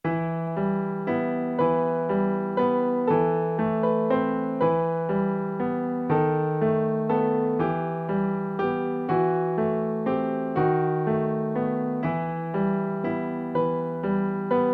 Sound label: practice winter celebration